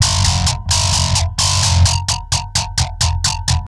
Squeak Drive, Industrial, Nasty

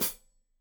Hat Foot, Hi-Hat, Cymbals Stereo

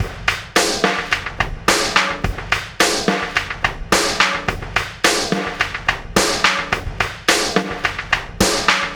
Ripple Perc

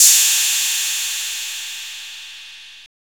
CYMBALS, Disco House; USB Soundscan vol.20 - Fresh Disco House I

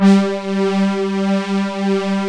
PADS; Pro-Rec - Synthsonic; Z1 PADS

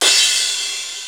TR, drums perc, Roland

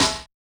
Yamaha DJX 2B, SD Hip Hop

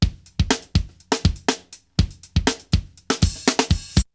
Drumloop; ZERO G - Datafile DISC 3

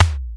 kick snare, Clavia Nord-Drum; 300 Drum Machines

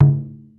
Lo Conga

